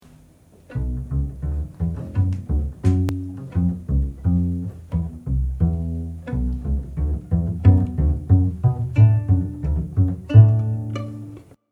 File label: Piano; Lyt-til-klassisk; Troldekongen